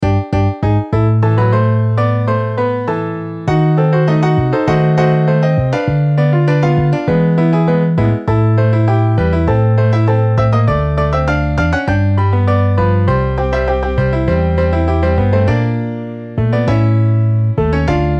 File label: Piano Bass; MIDI